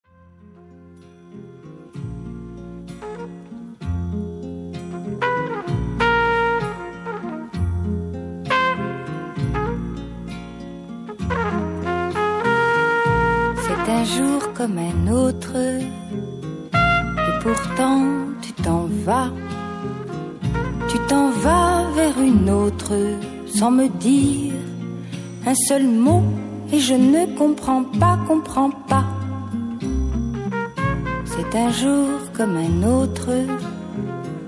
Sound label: French Cafe